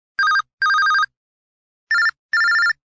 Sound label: Telephone; LG; KC910-Renoir